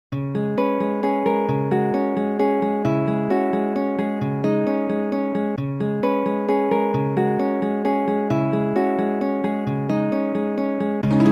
Pink Shell

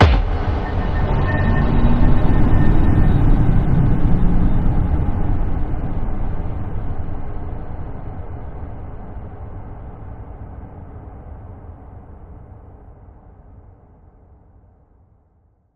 shock

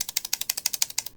type

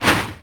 sniper disappear; crab

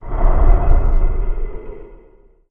spooky woosh

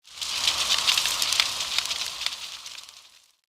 leaves

